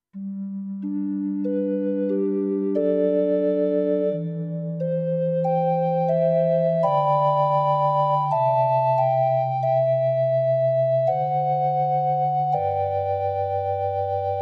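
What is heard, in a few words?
Trem Organ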